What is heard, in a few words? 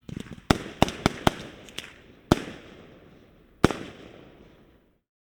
hangar; War Thunder